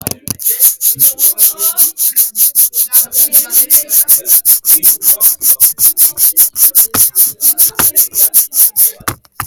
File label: asmr